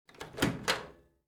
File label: microwave oven open